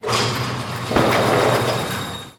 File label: Crane Drop Box; Still Life; windows